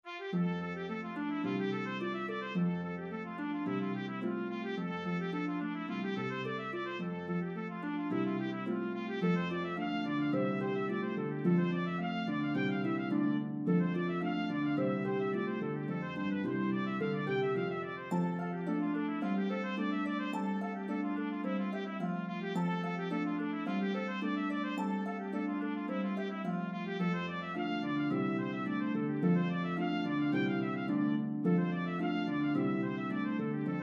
Trumpet Harp, Duet for Bb Trumpet & Harp, This reel